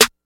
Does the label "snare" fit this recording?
Yes